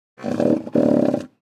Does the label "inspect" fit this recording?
No